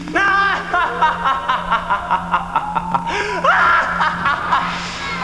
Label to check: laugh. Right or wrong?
Right